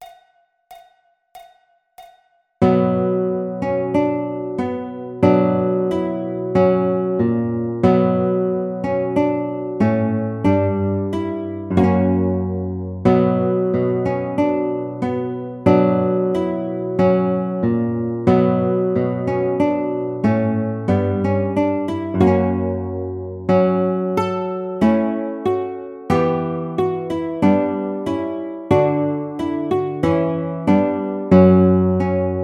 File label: Lute, classical